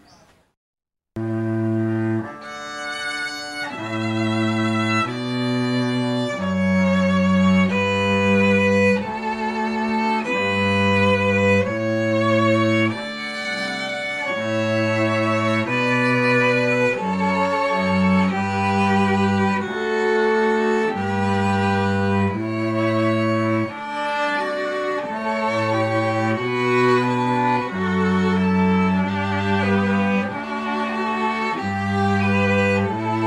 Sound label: strings trio violins cello